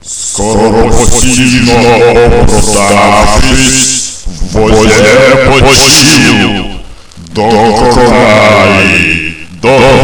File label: children